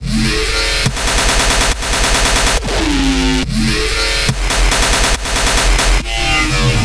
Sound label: bringer of despair bass; looperman